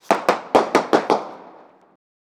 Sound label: 6 pops